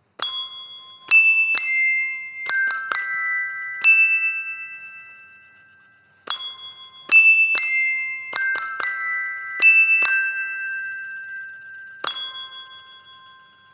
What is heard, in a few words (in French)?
cloches